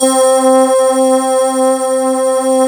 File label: FUTURE PAD